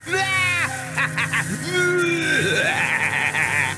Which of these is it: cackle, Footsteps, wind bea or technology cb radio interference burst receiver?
cackle